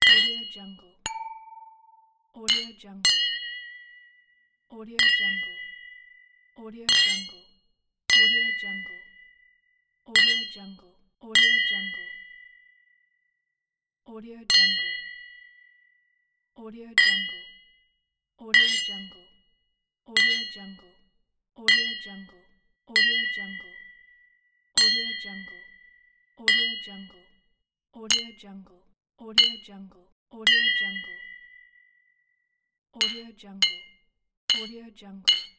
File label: glass clink